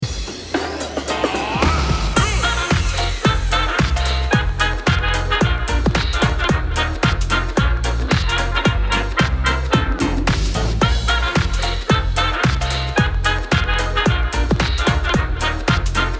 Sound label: Electro Swing